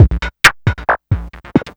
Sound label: Drums Strange; Sonic Foundry; Machine Language